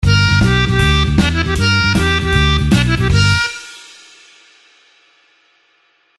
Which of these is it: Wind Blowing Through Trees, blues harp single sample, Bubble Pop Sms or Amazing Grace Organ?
blues harp single sample